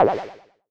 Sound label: shoot